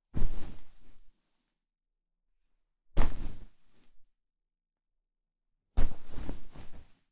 布団を跳ね除ける; KU100; 布団の音

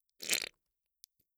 射精音; 効果音

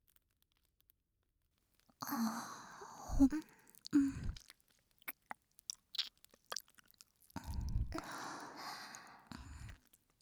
両耳舐めとお世話, ASMR

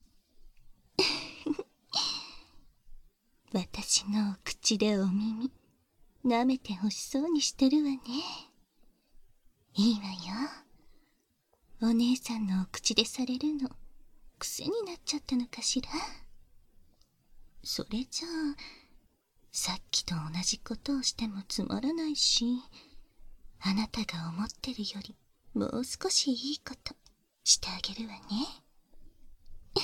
両耳耳舐め バイノーラルマイク; 効果音なし

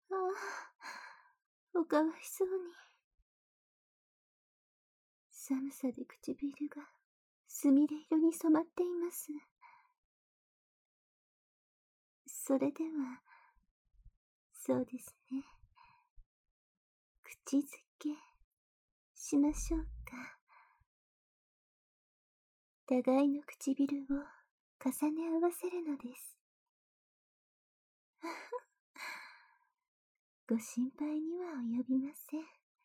キス・顔舐め・耳舐め; 雪女の雫～ねぶってすすって包み込んで、うんと気持ち良くして差し上げますわ♪～; 効果音あり

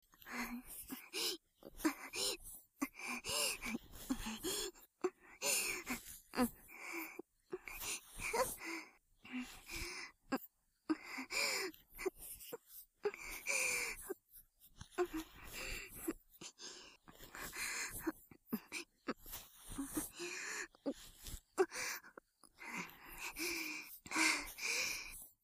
ループ耳マッサージ（通常左版）, ASMR

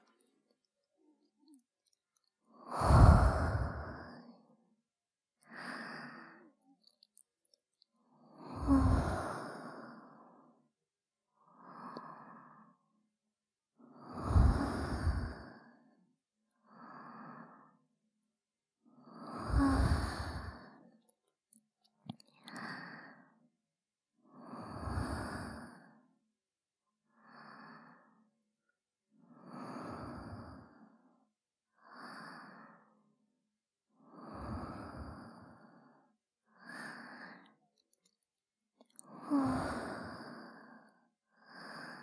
添い寝(右側), ASMR; 結乃

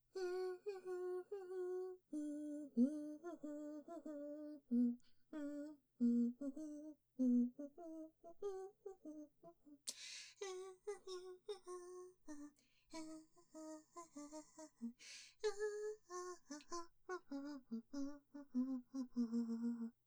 雪やこんこ鼻歌ループ用

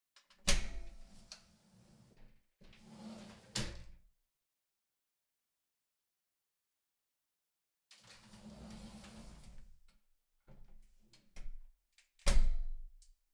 お風呂のドア開閉; 3DIO; お風呂に関する音

